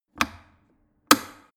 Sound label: plastic water boiler turn on off